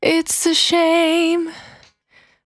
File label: Cry 68bpm B, Vocal; Zero-G